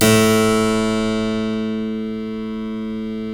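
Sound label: A1 clavinet left, Keyboards Acoustic; USB Soundscan vol.17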